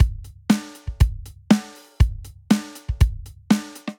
Gen Purpose Kit, drum kits; Ableton; Drum Booth

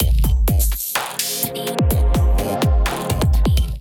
Face Melt Kit groove 126 bpm; MIDI Clips; 122 - 130 bpm; Ableton; Factory Packs; Glitch and Wash

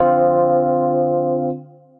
Piano & Keys; Beckoner; Ableton; Beat Tools

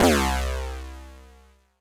So Caffeinated, Analog Bass; Ableton; Retro Synths; Analog Bass Vol 2

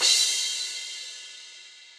Crash 17 Old School, Cymbal; Drums; Drum Hits; Standard Hits; Session Drums Studio

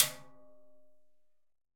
Timb L Cascara, Timbales; Latin Percussion; Drums; Drum Hits; Ableton; Factory Packs

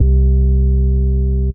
Bass; OC Bass

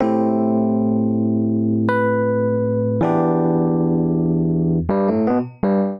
Chords E Minor 91 bpm; MIDI Clips; Accompaniment; Rcrd Plnt; Ableton; Factory Packs; Chop and Swing

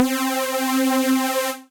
Super See-Saw, Synth Lead; Ableton; Factory Packs; Synth Essentials

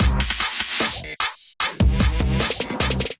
Kit groove 150 bpm; Clubber Kit

